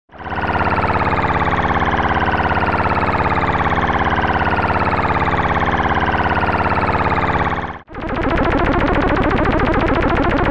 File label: Shepard Tones